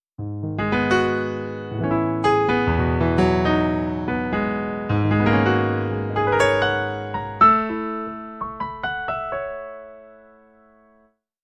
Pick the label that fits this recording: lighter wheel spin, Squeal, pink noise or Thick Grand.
Thick Grand